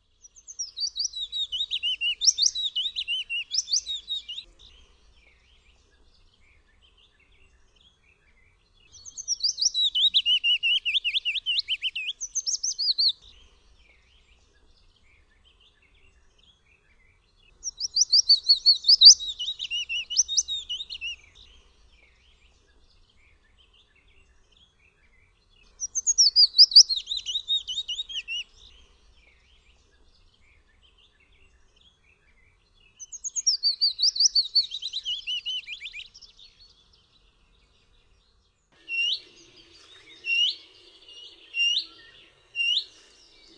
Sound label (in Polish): PIECUSZEK, birdsounds